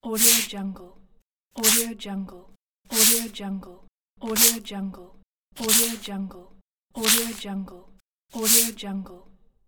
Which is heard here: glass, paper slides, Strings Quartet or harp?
paper slides